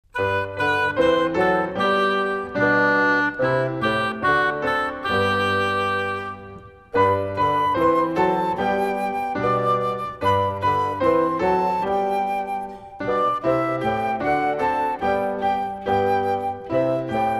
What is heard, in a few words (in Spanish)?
musica